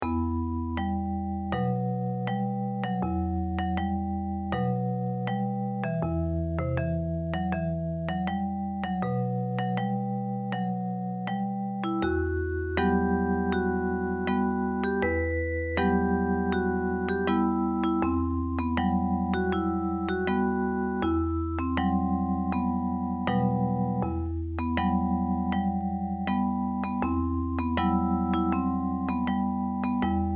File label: japanese folk song; nagasaki burabura bushi